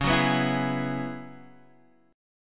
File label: F sharp 7th Suspended 2nd Suspended 4th; x,4,4,4,5,4